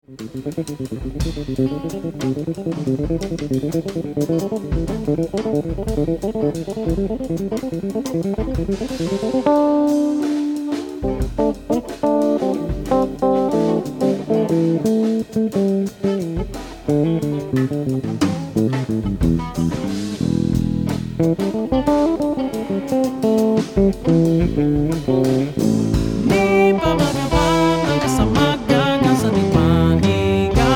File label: Blues Jog